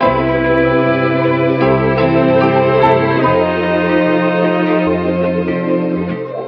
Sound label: strings; Phonky